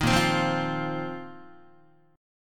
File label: Bb Suspended 2nd Suspended 4th, Guitar; strummed